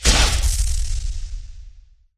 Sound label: Light hit; friday the 13th killer puzzle